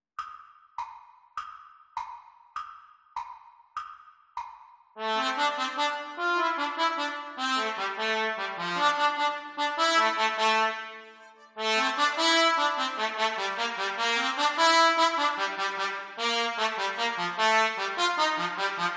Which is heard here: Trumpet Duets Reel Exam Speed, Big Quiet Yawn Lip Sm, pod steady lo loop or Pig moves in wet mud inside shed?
Trumpet Duets Reel Exam Speed